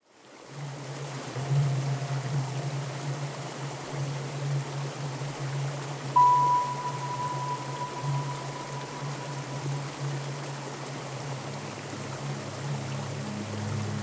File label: Exotic Nature